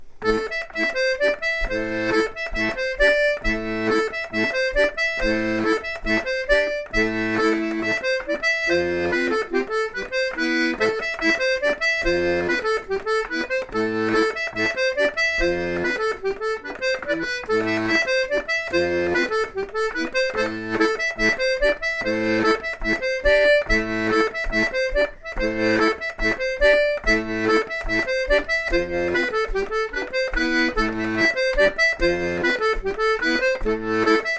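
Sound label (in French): laride, accordéon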